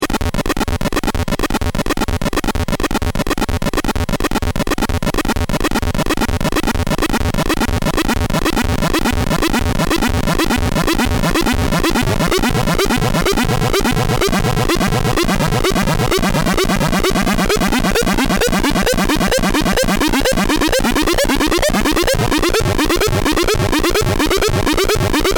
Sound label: Freq Frog And Virt Tremolo